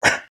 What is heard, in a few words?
monster; CC0 creature sfx 2